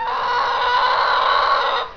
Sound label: chicken, alert; svencoop; hunger